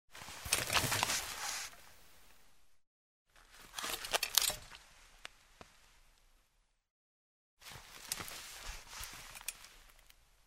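folding garden chair sitting down standing up moving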